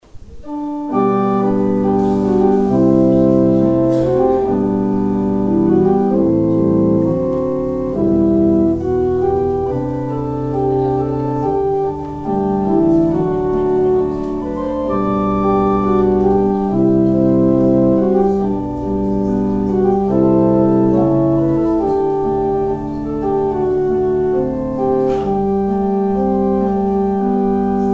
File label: organ interlude; Offering interlude